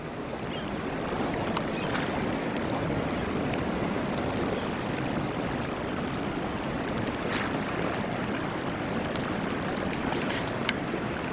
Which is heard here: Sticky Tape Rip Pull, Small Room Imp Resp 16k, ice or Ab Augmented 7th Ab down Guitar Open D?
ice